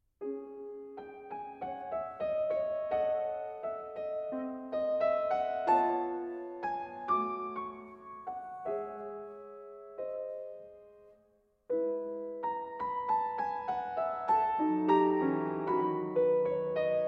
Piano Suite No. 8 in B-Flat Major III. Theme & Variations